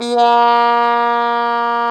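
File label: Plunger Mute, Brass; Roland L-CD702; Plunger & Wa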